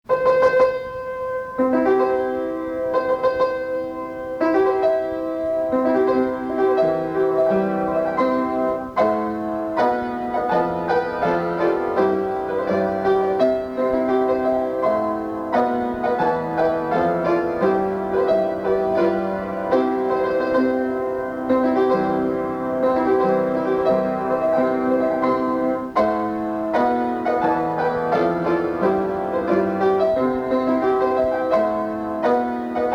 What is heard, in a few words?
Wedding March piano; Mendelssohn